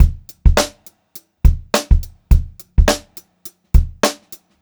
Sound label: Funk Groove Drumloops; USB Soundscan vol.38; AKAI